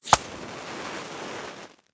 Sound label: ignite campfire; STALKER; IWP